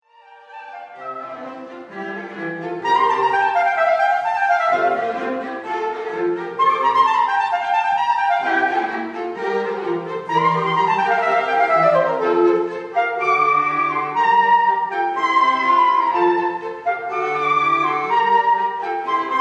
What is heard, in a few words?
Oboe Quartet; Wolfgang Amadeus Mozart; Oboenquartett KV 370 1